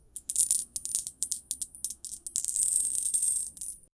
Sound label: a gentle soft sound of, money